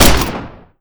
typewriter shoot

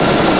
minigun spin; tfc

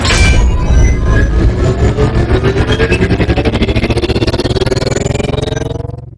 armor regenerating; baron

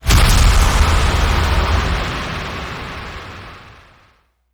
stinger demon spawn; cinematic

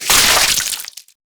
bloodspurt; demons; intro first priest death

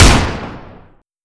lmg shoot

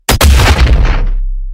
shotgun fire

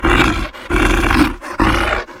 crawler idle; shadows

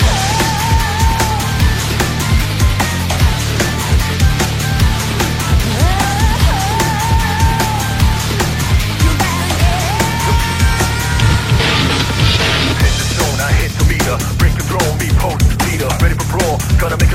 laser beam vocals loop

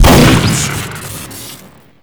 shoot crit; stormbringer

